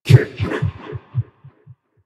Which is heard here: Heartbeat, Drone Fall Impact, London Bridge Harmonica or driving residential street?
Heartbeat